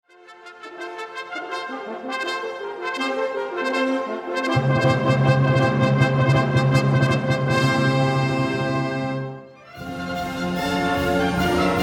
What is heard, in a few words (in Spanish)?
galopp, para banda; GUILLERMO TELL (Guillaume Tell) (William Tell); Fernando Bonete Piqueras